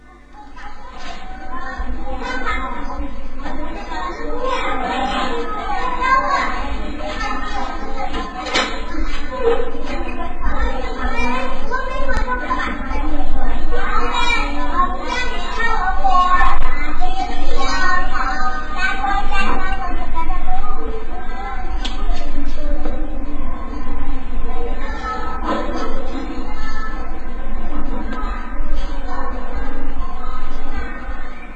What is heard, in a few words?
kids in class